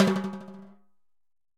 Timb B H Pressroll, Timbales; Latin Percussion; Drums; Drum Hits; Ableton; Factory Packs

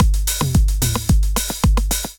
909 Kit groove 110 bpm, Drum; MIDI Clips; Flavour; Ableton; Drum Essentials

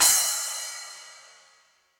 Splash 10 Direct Natural, Cymbal; Drum Hits; Standard Hits; Session Drums Studio

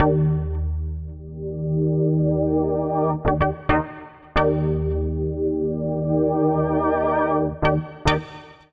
Poly Keys (Eb) 110bpm; Music Loops; Poly FabKeys; Ableton; Factory Packs; Singularities

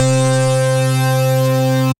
Resurrection Lead, Synth Lead; Ableton; Chop and Swing

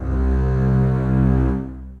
Double Bass Section Legato; Ableton; Orchestral Strings

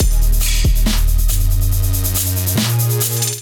groove 140 bpm; MIDI Clips; Ableton; Factory Packs; Beat Tools

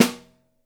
SN1 Overhead, Snare; Ableton Foundation FreePacks; Snare Designer

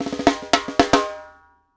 116 Funk Drum Fill; R&B Funk Soul; Funk Drums

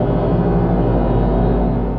Wind Harp Drone; Instruments; Dark Textures; Ableton; Mood Reel

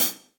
Hihat Closed Room With A Vista; Drum Hits; Standard Hits; Ableton; Factory Packs; Session Drums Studio

